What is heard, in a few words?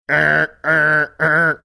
making warning buzzer sounds